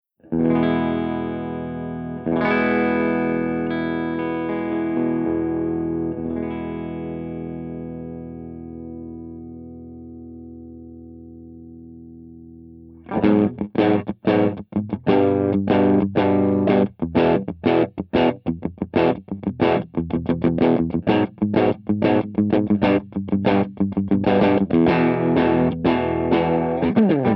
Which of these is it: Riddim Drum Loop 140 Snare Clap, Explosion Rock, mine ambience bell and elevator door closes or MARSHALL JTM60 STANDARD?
MARSHALL JTM60 STANDARD